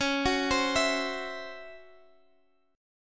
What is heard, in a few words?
C sharp Minor Major 7th Double Flat 5th, Guitar, Standard; arpeggiated; 21,21,22,21,x,x; C#mM7bb5 Chord